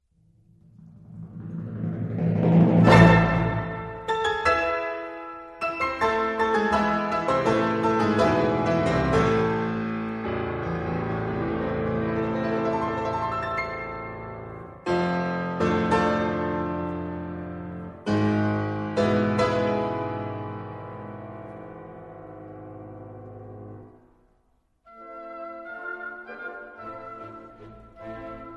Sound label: Piano, Piano Concerto in A minor; Classical Music; grieg; Piano Concerto in A minor Op 16 - I. Allegro moderato